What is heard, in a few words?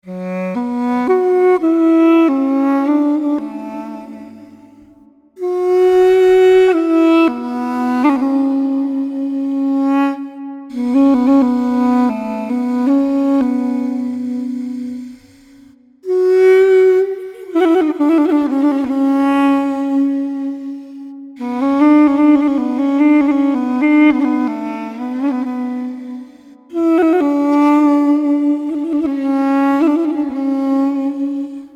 Deep Duduk